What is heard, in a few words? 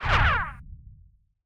ricochet